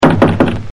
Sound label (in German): Klopfen; Haushalt